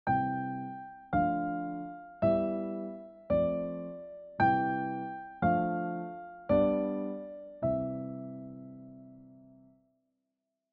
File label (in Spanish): Piezas con acordes